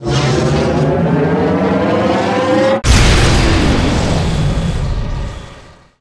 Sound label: gravitywell explode; Tanks